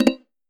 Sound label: match join; Crimson Stella